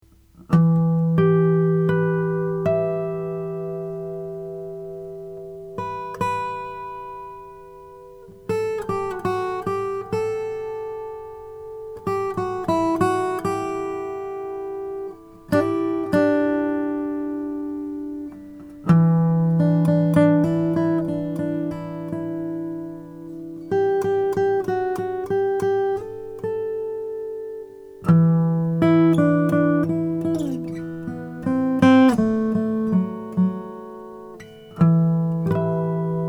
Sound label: froggy bottom h12 deluxe custom golden mah